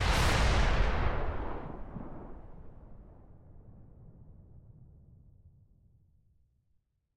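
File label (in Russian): дальний одиночный выстрел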